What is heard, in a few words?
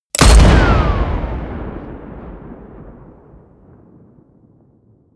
shot